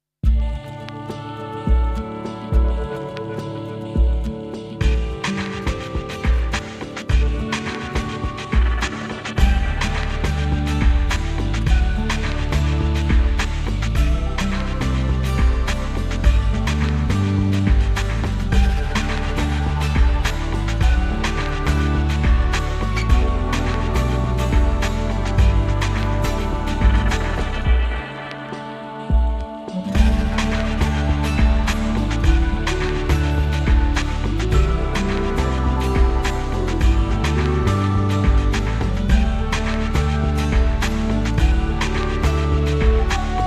Groovy Suspence, chill out medium carefree percussio